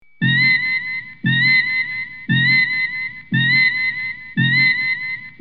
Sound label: sickbay scanner heartbeats clean; TOS